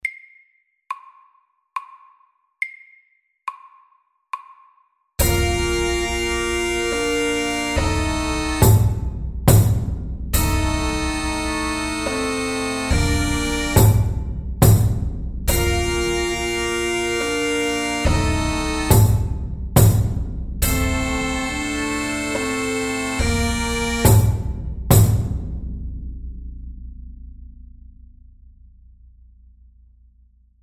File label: Stomp SLOW 70